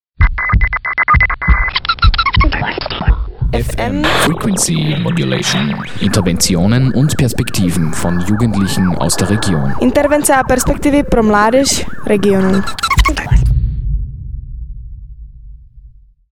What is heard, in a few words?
frequency modulation